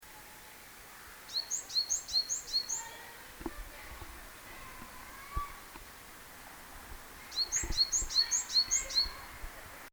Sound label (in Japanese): shijuukara